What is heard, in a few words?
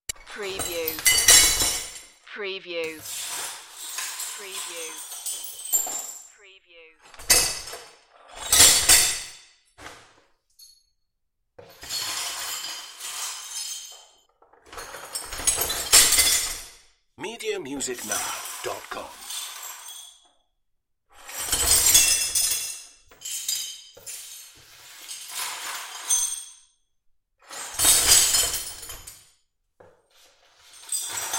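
Clinking Glass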